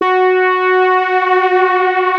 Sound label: FAT LEAD, Powered DX; Wizoo